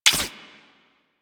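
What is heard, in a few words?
Primary crossbow Mech; Warframe; Weapons; Tenno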